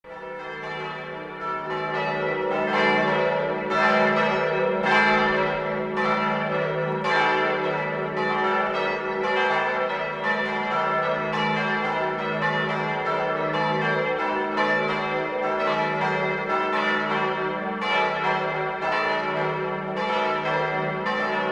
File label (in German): Glocken Christkoenig Bischofsheim; Mainspitze